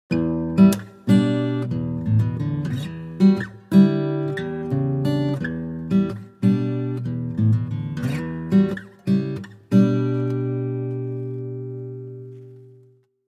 Finger Mikro

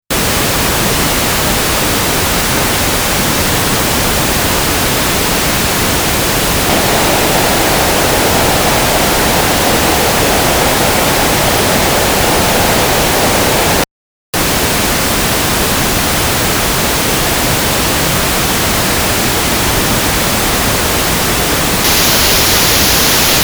Rosa Rauschen

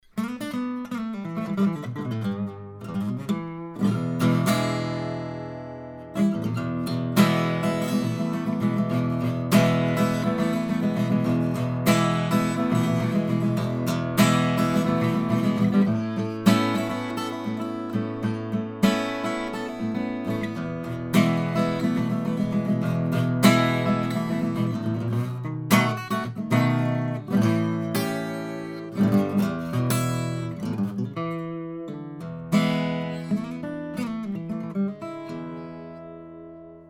WA47jr Gitarre 40cm

